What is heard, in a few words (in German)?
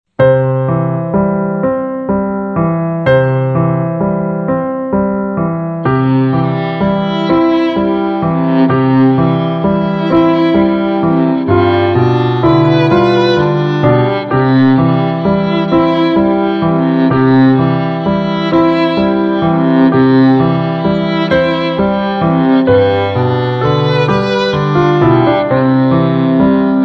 Die Gondelfahrt, Viola; Fiedel Max 1 Viola